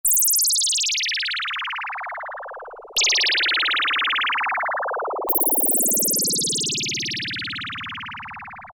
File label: sound effect, Korg PolySix; Factory Presets Bank B